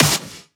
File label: Snares; Vengeance - Essential Clubsounds Vol.4